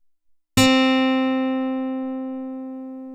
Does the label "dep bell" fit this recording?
No